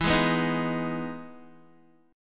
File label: E Major 7th E down Guitar; EM7 strummed; JGuitar